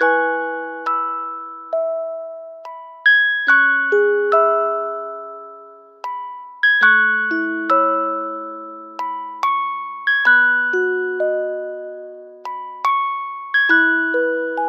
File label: keys music box; loops